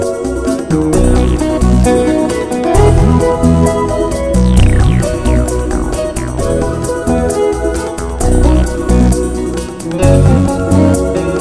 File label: Sweet Bossa